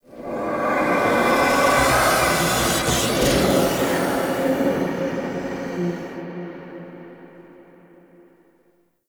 Whispers Large; MITW; Warframe; Ambience; Entrati

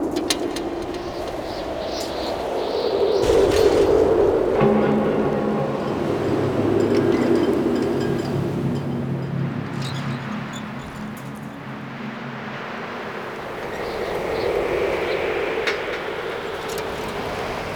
Exterior Amb Bed; Tau

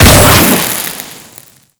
Auto Crossbow Fire Charged; Warframe; Weapons; Tenno

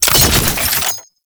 Shield Drone Start; Enemies; Abilities; Warframe; Tau; Dax Medic

